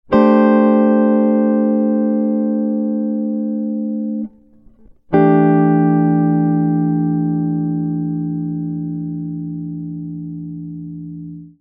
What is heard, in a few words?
chord melody, guitar